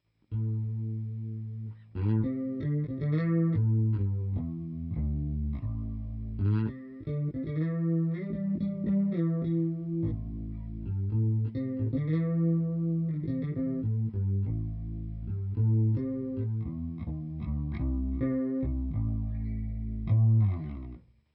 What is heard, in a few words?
BASS; DComp is a digital re-creation of a classic guitar compressor pedal